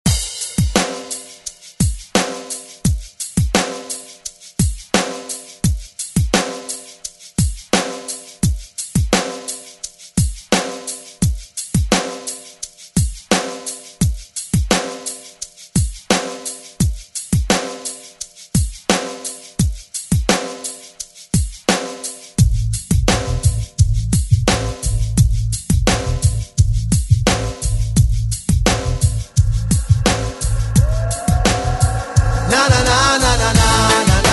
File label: Re Drum; DJ Mhark